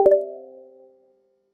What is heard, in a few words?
peek connect